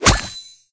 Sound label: hammer